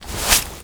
grunt foley arm

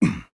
vce land

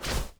foley leg

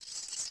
Rifle Clean Long; Civ4 warlords

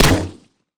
Weapons Cannon Human Grenade Launcher; DCS

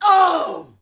Axmn Die Vox; Civ4

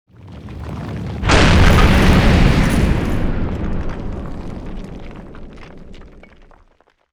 speaker mohole passby

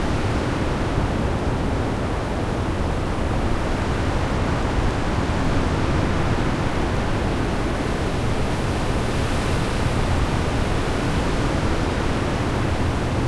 ambient; World of Tanks

